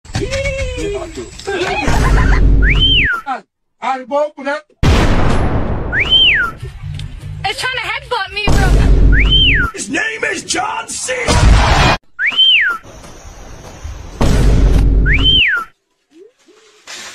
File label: funny epic fail; audio meme